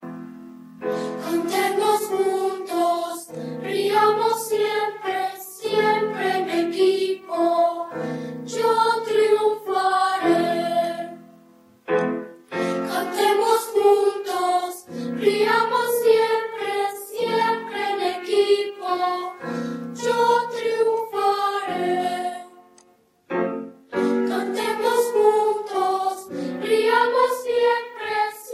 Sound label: Singing together